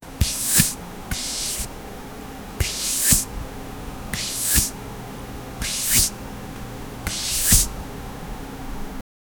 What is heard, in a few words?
extreme close up of finge, textures